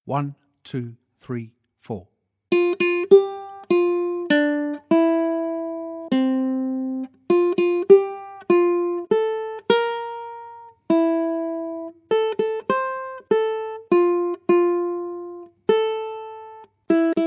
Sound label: Accompaniment, ukulele